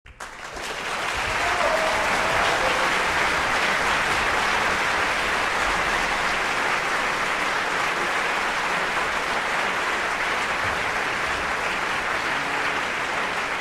Applaus van publiek; Sound Effects Deel 1

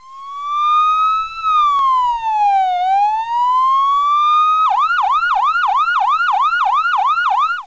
Sirene; Sound Effects Deel 1

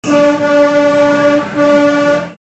Disco Train Hoorn, Kermis

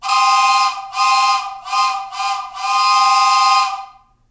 Trein, Treinbaan Geluids Effecten

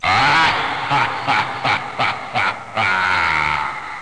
lach; Spookslot Tunes Effecten

